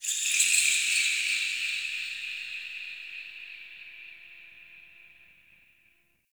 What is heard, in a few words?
High Creature Echo, Ambience, Dungeons; Under City